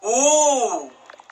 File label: ohhhhhhh